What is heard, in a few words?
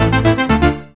short happy success sound